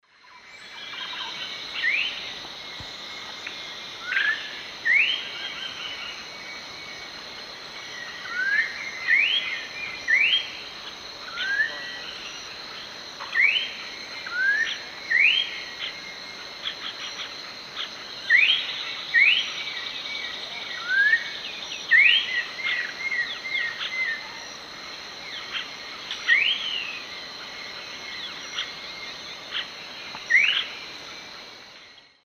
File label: Icterus croconotus, song; S. America; Eastern Lowlands